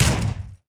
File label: tower rocket Gunners basic Attack